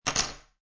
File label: Poker Chips Drop Two Chips On Wood Table; Gambling